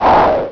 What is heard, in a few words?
volcano; Populous Demo